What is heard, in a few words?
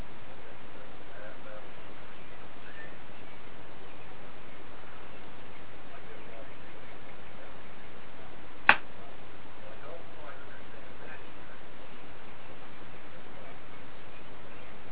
empty attic; villisca